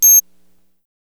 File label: bomb beep loop